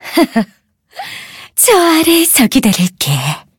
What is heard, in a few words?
hit; Cookie Run